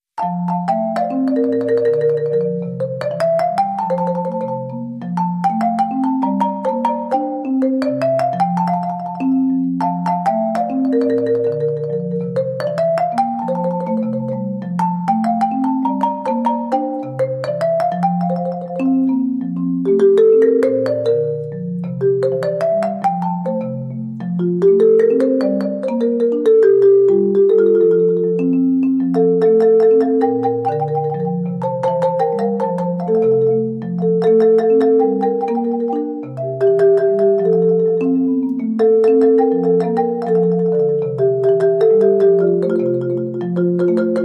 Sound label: Solo Marimba